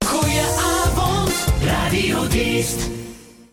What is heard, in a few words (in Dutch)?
jingle goeieavond; Diest